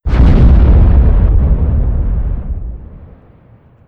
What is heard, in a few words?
bang